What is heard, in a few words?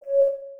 hotwire connect; hijack